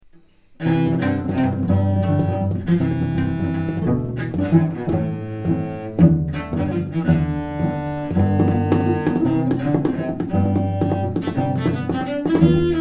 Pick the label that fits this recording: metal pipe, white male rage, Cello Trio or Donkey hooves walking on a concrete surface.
Cello Trio